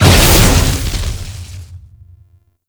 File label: Enemy Spawn; Warframe; Man In The Wall; Enemy Gameplay